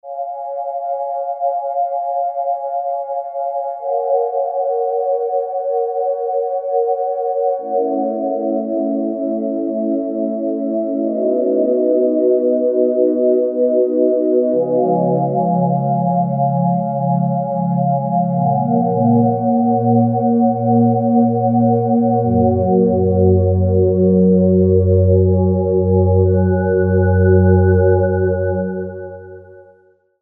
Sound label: Sine Pad